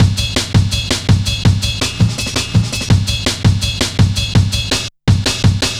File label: Samurai, Drum Loops, 165.5, Jungle